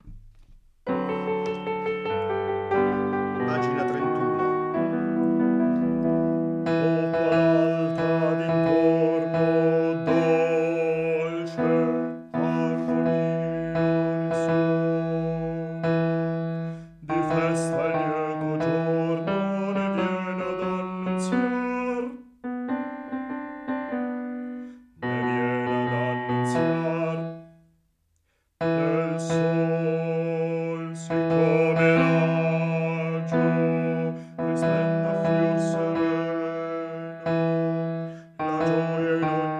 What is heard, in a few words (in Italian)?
bassi; O quale alta dintorno